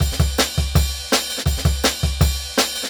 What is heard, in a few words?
Jungle Breaks